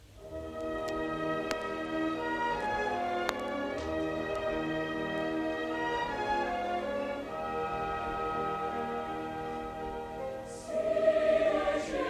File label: Wedding Chorus; ISOMATA; In Windsor Forest